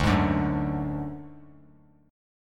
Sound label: D Major Flat 5th D, chord; strummed; JGuitar